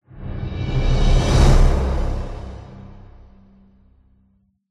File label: Main Menu Music Intro; Fortnite